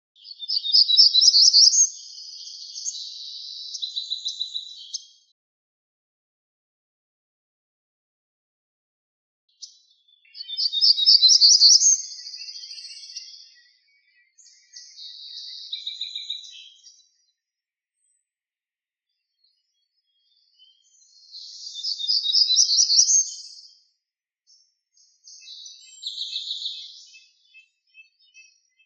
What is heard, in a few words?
Black Throated Grey Warbler; Istvan B Orosi